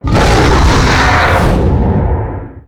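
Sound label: death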